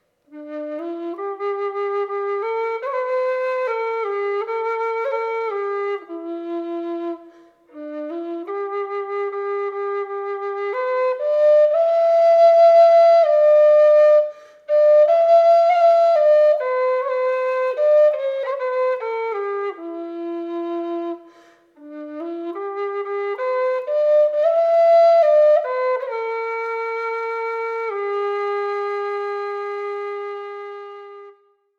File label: low d whistle; goldfinch